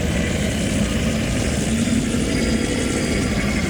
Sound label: ravenous loop; LoL; yorick